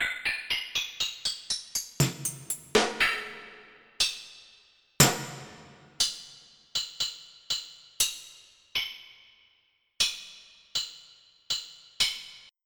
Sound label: Snare Stacks